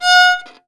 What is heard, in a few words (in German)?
GEIGE; Best Service; Alpine Volksmusik